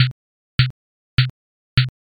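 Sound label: footsteps leather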